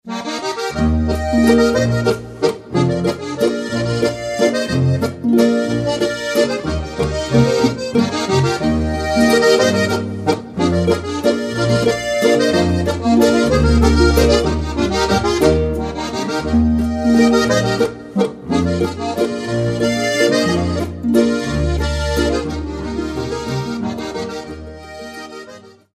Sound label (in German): walzer